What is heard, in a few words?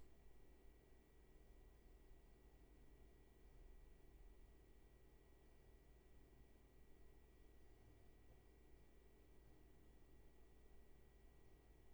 room tone